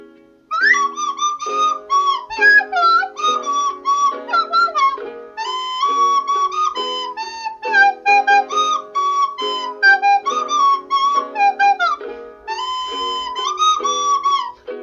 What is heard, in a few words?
bad flute cover